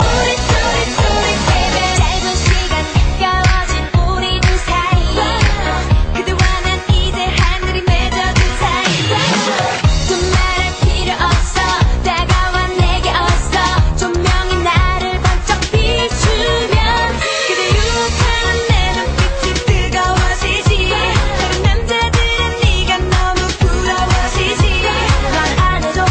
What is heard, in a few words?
Ahh